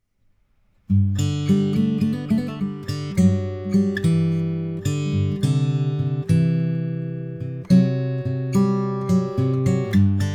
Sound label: MXL V88 Test AG